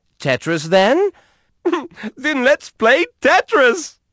Start Game; kids-tetris; Haunted

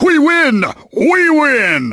Game Win Game; kids-tetris

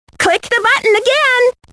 Help Push Button; kids-tetris

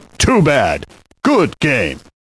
Game Lose Game; kids-tetris

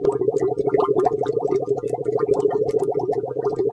Bubbles; kids-tetris; Lab

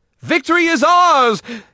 Win Game; kids-tetris; Haunted

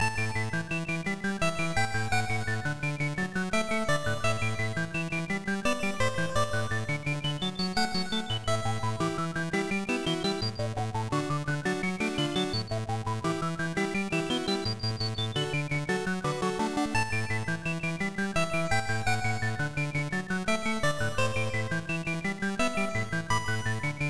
BGM Last Loop; 774 deaths

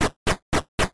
2D Up Down; 774 deaths

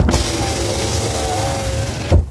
window down; kids-tetris

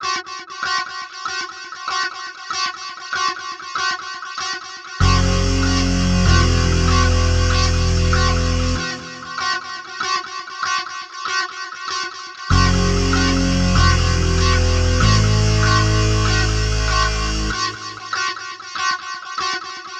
tense; deltarune

